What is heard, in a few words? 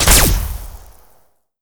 alien disintegrator